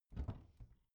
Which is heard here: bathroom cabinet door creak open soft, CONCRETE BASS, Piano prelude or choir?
bathroom cabinet door creak open soft